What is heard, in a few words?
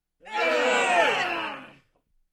Crowd Disgust reaction